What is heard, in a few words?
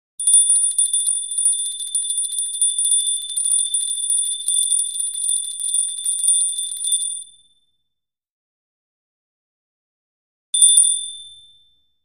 Bells Medium pitche